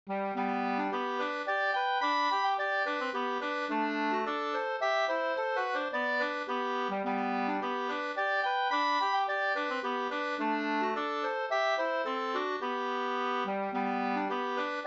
polska, 2st; e Haglund Svartsjolandet Up

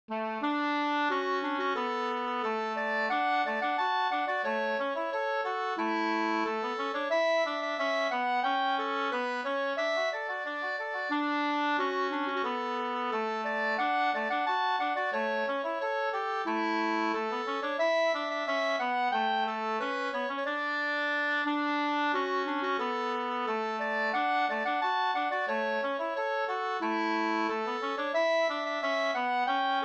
ganglat

